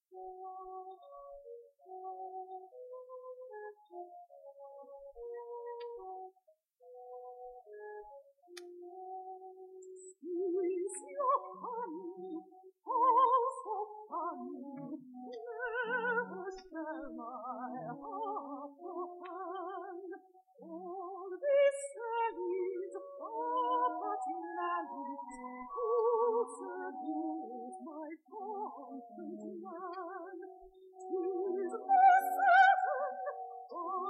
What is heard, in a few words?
air